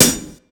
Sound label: Hat, breakcore Drum Hits